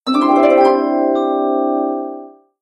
Entering Level; super wiloo demake; ps vita ps4 switch windows xbox one gamerip 2019

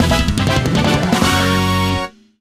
Get Jingle; mario tennis ultra smash 2015 wii u gamerip

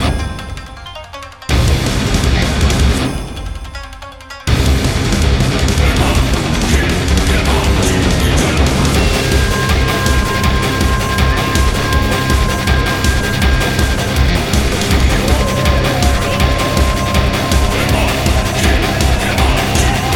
Boss Loop; Jitsu Squad; ps4 ps5 switch windows xbox one xbox series xs; Sakurahills

